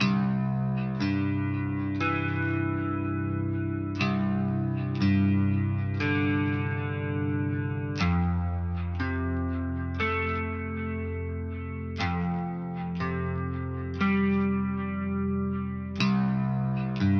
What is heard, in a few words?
SlowDance; Eversoul; Android